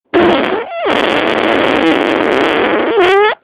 Afónico, Pedo

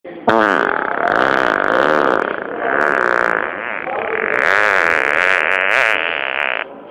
PEDO DE VESTUARIO

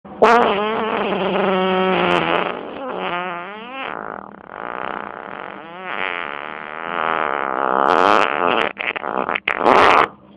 Pedo; de S.antoli

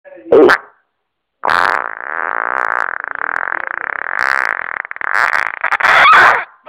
Resquebrajo, pedo

